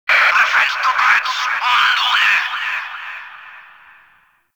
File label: Interior Notification, Fortress Ambience; Warframe; Grineer